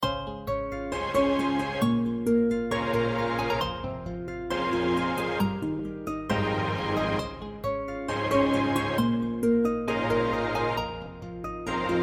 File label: r b instrumental intro